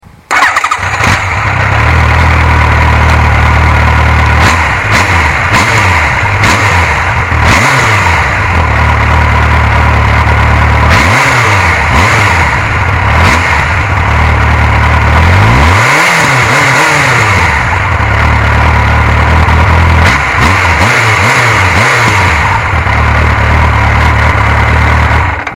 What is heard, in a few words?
xjr1300